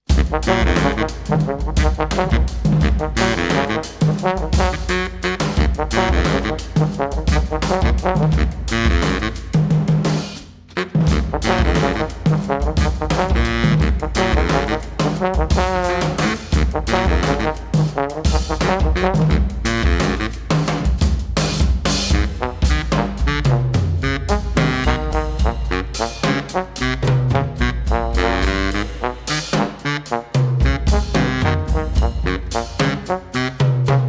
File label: brass band